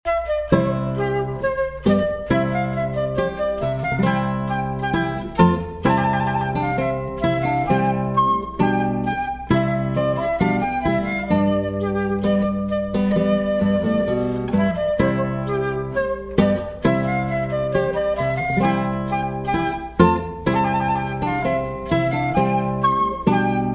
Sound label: Classical Gtr